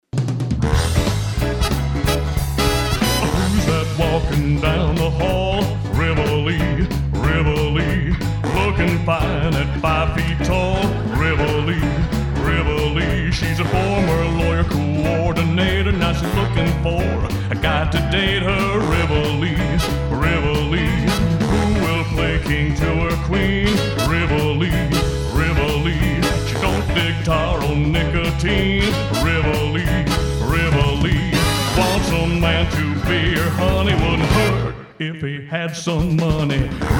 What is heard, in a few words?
humming